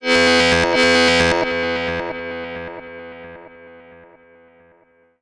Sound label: FX DIST; FX DISTO